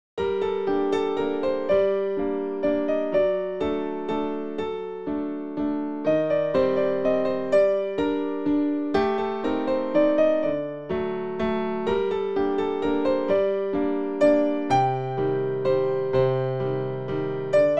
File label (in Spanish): escala